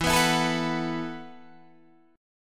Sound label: E Suspended 2nd Suspended 4th E, down, Guitar, Standard; strummed; JGuitar